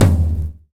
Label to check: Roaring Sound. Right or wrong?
Wrong